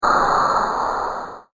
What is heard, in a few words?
Retro Arcade Explosion; Video Game Sound Effects